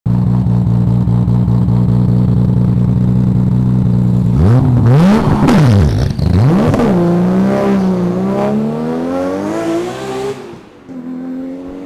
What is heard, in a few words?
Skyline GTR pulling away; Fastcar Magazine; RK Tuning